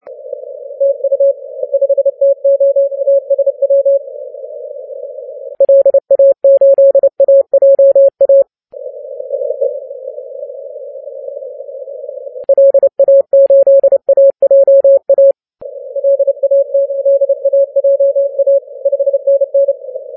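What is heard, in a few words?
30m continuous wave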